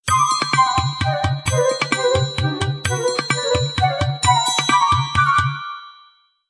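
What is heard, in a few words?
Sony Ericsson; Firefly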